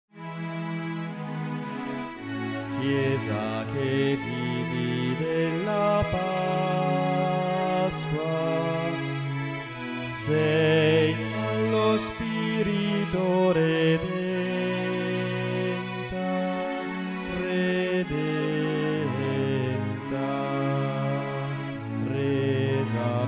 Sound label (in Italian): Chiesa del risorto contralti